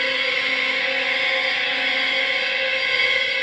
Cigar Fuzz FX 140 C, guitar drone

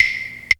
Wood Block A; Zero-G Jungle Warfare 1, 2 & 3; 90 s Sample CDs

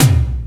ANALOG GIANT; OMI - Universe of Sounds

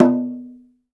GENDANG A4, DRUM; Spectrasonics; Heart of Asia

